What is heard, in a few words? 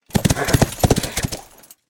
Armored Warhorse Attack